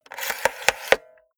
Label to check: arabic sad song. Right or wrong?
Wrong